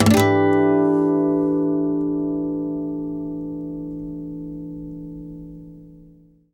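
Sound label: Nylon Guitar harmonics; E-MU Producer Series Vol. 8 – Platinum Phatt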